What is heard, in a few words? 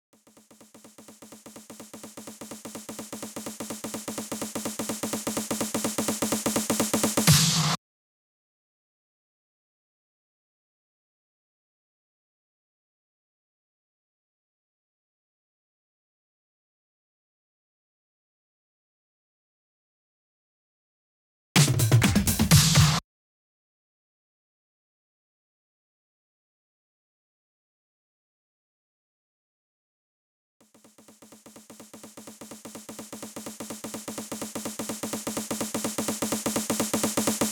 Drum Fills, Future House 126 BPM F; Big EDM; Future House Tools; Construction Kits; Let The Beat Go